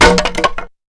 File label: Wooden Stake Break